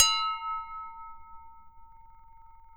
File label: GLASS, HOUSEHOLD; AKAI Anime Sound FX